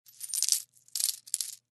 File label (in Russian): zvuki deneg